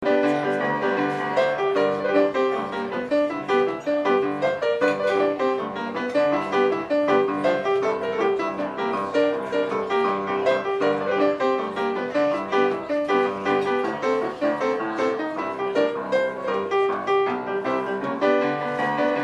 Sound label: bluesy